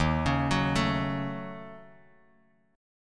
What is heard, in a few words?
D sharp Minor 7th Flat 5th D sharp, down, Bass Standard; D#m7b5 arpeggiated